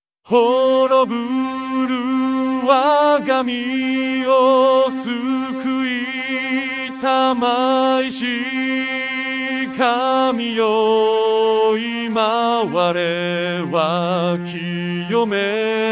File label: vcl tenor; easter; HoroburuWagamiwo